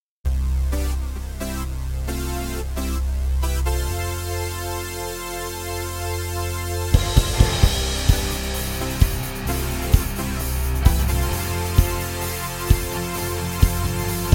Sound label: natural drumset heavy